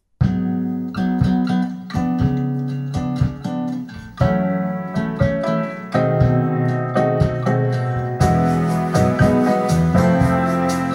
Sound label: analog